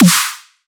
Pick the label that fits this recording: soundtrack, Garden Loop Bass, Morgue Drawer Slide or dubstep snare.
dubstep snare